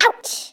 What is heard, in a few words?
Ouch